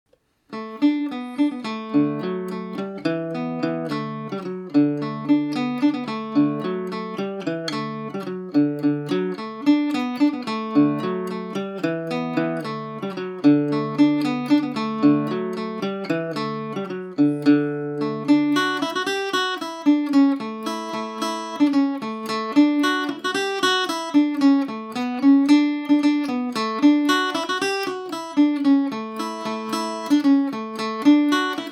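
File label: D major, irish mandolin; The £42 Cheque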